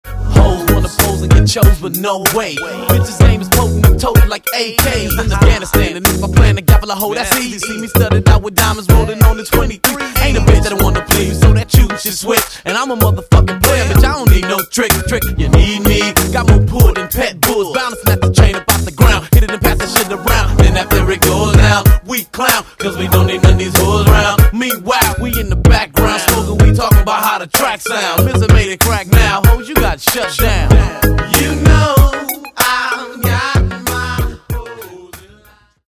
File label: rap; Internet Session, Los Angeles